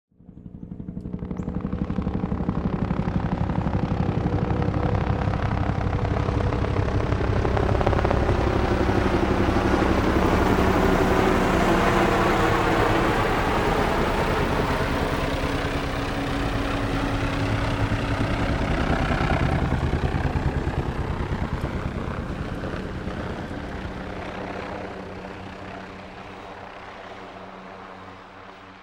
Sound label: Military helicopter overhead aircraft flying away